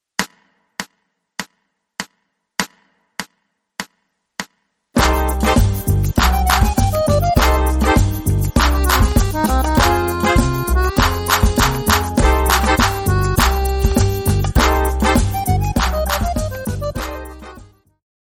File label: Latin Medium